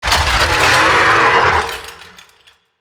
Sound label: Necramech Melee Idle; Battle Barks